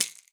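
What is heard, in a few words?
MARACAS; Xsample VOL-9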